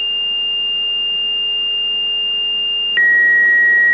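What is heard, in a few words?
Tones, Fire Company; FARMERSVILLE 3-5; REFTON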